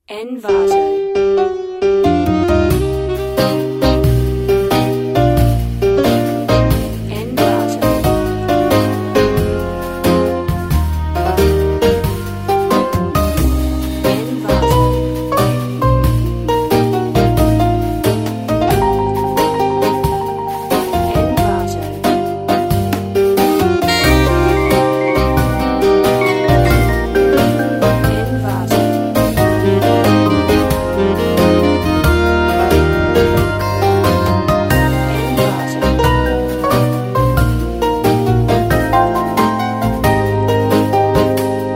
drunk mans blues

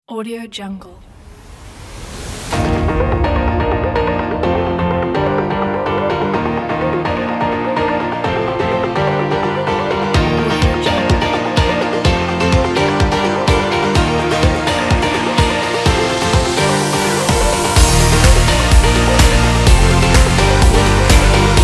uplifting upbeat pop

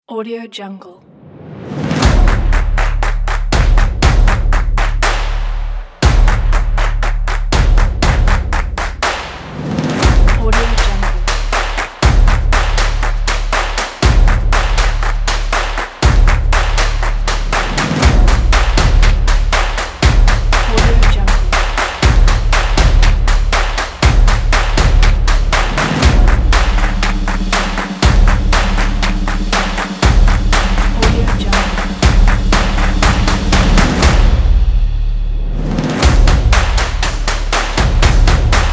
stomp

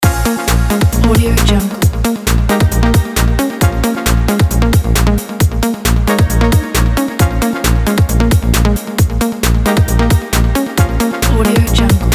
announcement loop

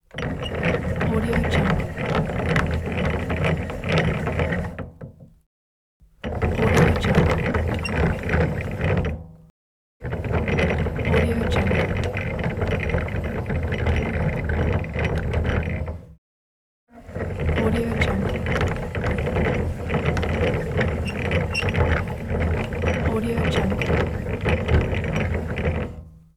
mechanism

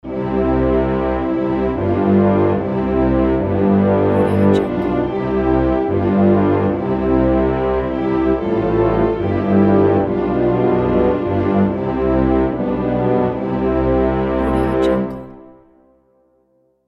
royal orchestra logo

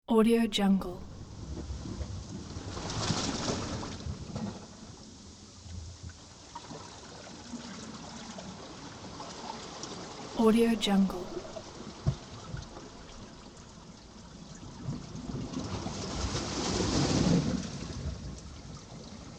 gurgling sea waves

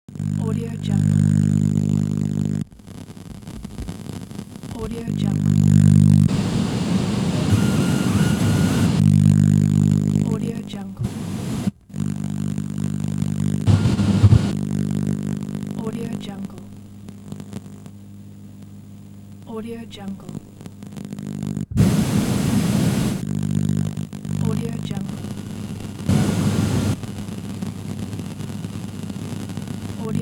long radio noise

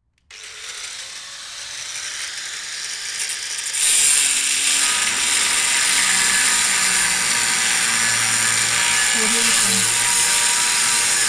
angle grinder

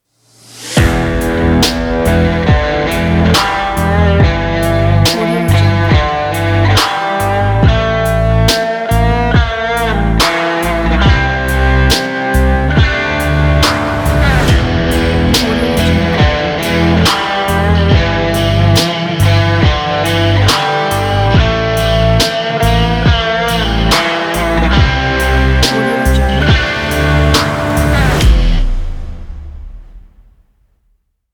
sports stylish rock; uldinmusic

